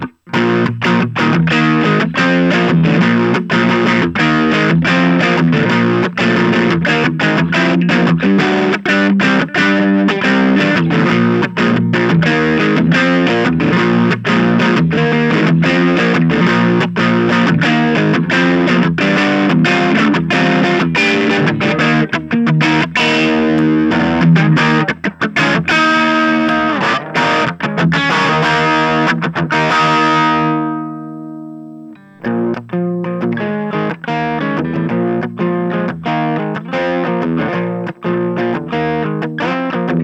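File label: Overdrive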